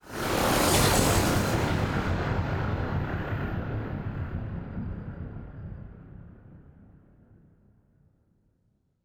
ship battleship move; Stellaris; machineage; ships

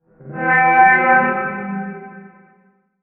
elder tiyanki idle vocal; Stellaris; distant stars

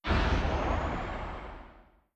distance missile fire; weapons; Stellaris

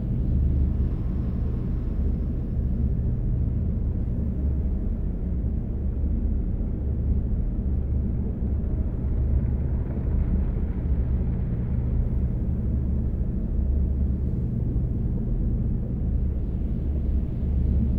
titan idle; aquatics

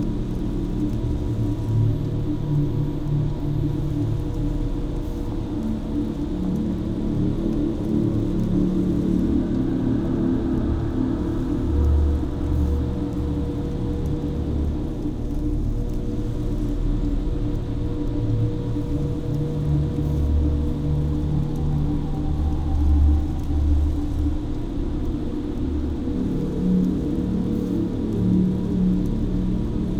ship titan idle; ships; Stellaris; toxoids

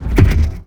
shield hit; Stellaris

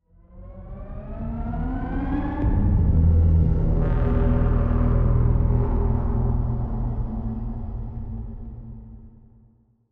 psi ship juggernaut move; ships; Stellaris; shroud

